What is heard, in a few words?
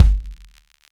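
bass drum, right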